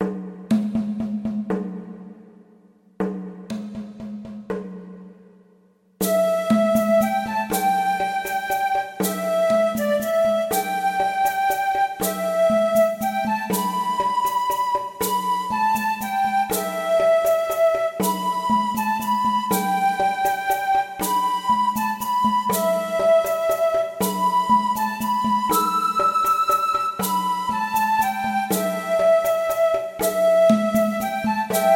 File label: Canon In Three